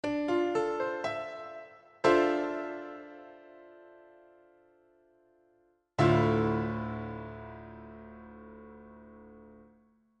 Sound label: d minor 9